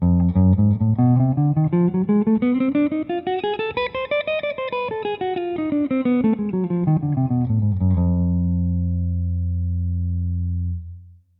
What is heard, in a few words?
G dim, scales